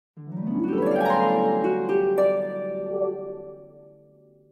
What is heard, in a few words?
Forest Melody Huawei